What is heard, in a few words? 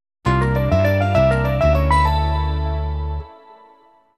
some achievement sound maybe; chibi robo photo finder